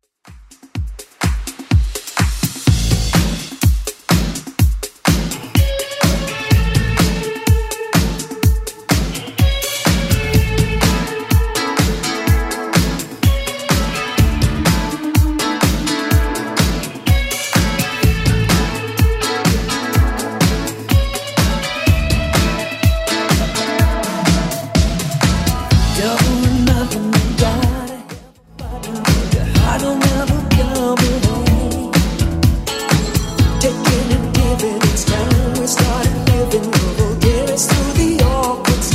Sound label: 80s Club Edit 125bpm